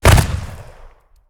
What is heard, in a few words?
flame explo